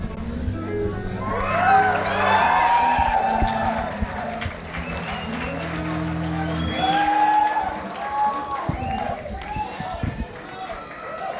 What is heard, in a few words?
Tuning